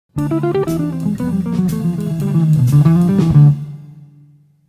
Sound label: Melodic Line